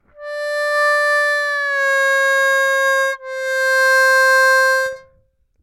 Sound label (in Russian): 4 -4’+4 плавно, губной гармошке